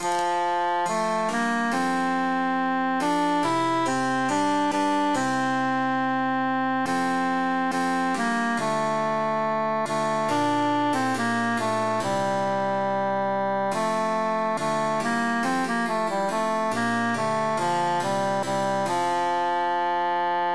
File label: tenor emphasized; tant que vivray